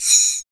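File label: PER PROCU, Procussion, percussion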